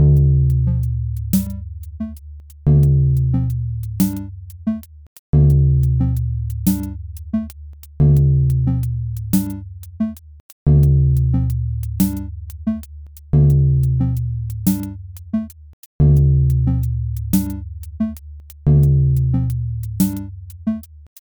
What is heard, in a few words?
beat orthodox